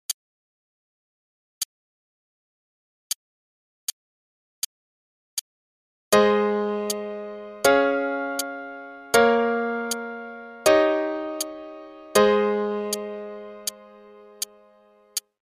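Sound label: Ab Major Chord Progression only (quarter note=80); CM Level 5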